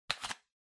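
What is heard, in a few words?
Ceiling Light (remote control Cover Open)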